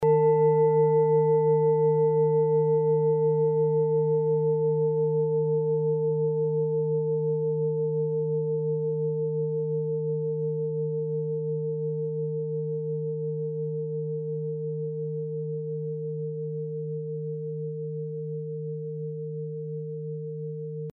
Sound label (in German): klangschale ladakh; Klangschale Nr.7 Bengalen; Hörprobe der Klangschale